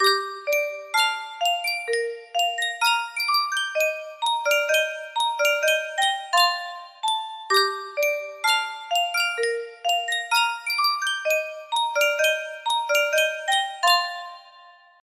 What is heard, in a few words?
sankyo music box, melody